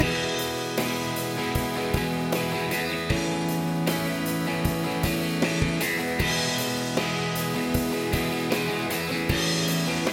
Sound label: drum